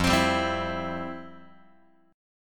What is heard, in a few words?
F Major 7th Suspended 2nd, F, down, Guitar